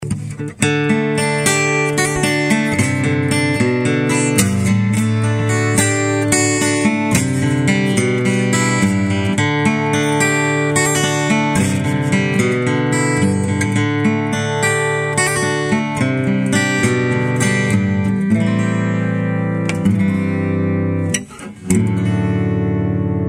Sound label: Sample, guitars